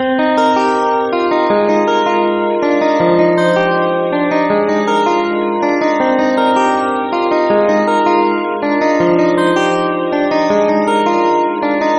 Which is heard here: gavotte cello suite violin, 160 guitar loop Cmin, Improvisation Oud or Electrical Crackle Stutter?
160 guitar loop Cmin